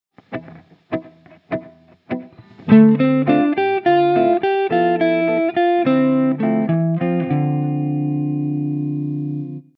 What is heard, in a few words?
dorian, guitar